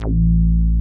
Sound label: HOUSE BASS; Masterbits; Dance Trax